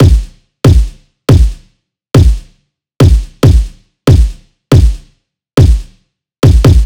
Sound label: kick 140, Kick Loops; drum sample pack; Dubbi; SEISMIC; Slate